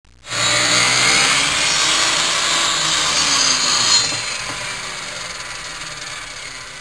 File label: Electric Saw